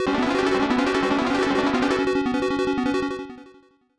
Jackpot Hit; Hisshou 777 Fighter II; SNES

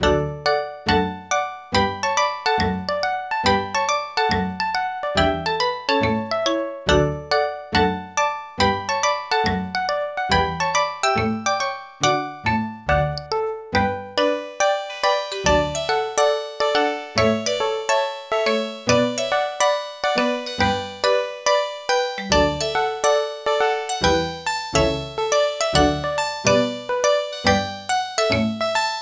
Arcade Music

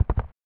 Goalie Knocked Down

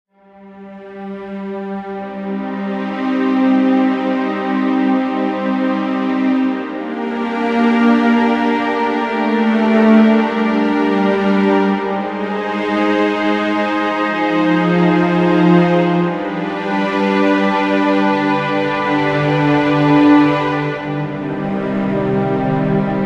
Sting Sad; Mass Effect; Council Denied

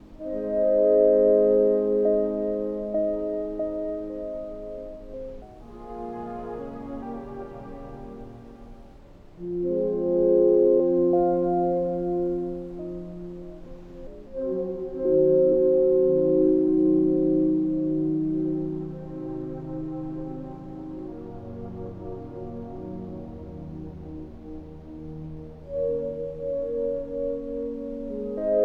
Autumn Pads; Proteus

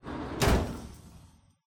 Machine Room Metal bridge

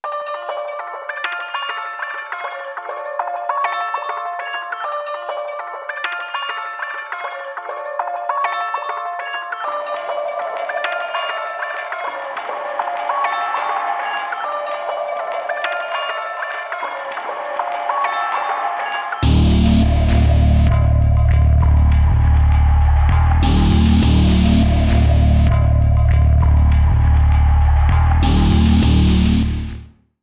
Loading Screen; Verrückt; Call of Duty Zombies; Treyarch 2008

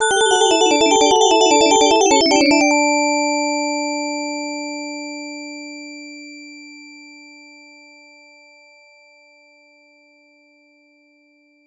Stage Clear; Ghosts 'n Goblins; arcade

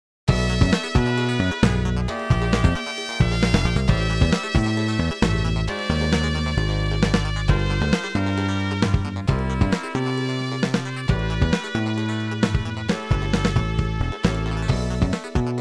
break beat; mission 5-2

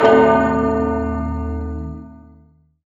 ui cross bell; Lucius

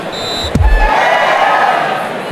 SFX Soccer Success; Life is a Game; android

